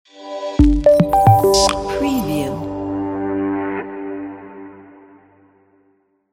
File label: elegant glitch logo